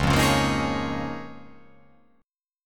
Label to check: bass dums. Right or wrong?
Wrong